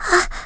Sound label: Light Gasp; Colin gasping lightly